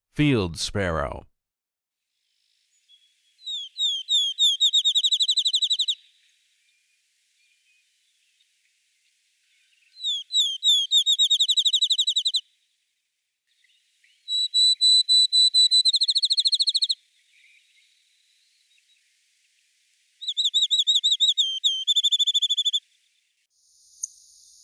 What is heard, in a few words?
Field Sparrow, bird calls; Non-Game Birds